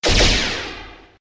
snd laser

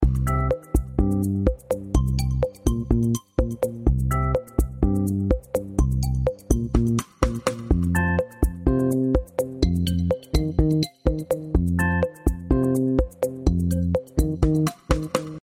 game loop

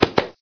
pillow

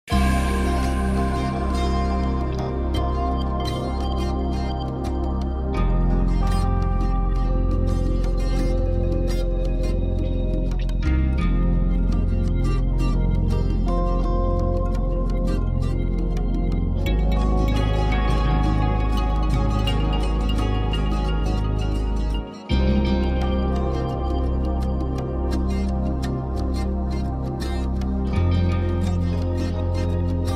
game music; jinhuashi2048

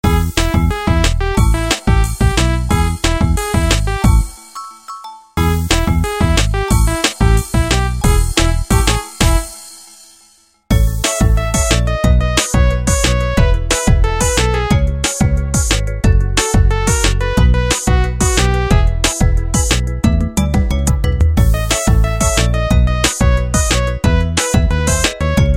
loop

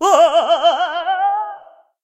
man falling

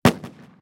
snowflake destroy; game

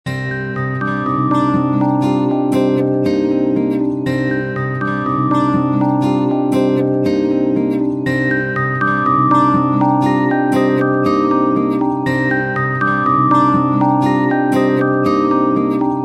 lose theme; jinhuashi2048

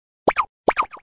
warning

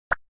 chain removed; guodongrenwu

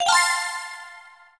generate bonus

